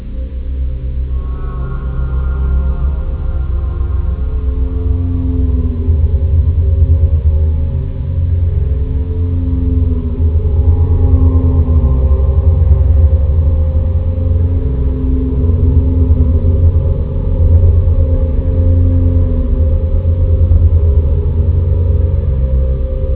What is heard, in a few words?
music creature; baseq2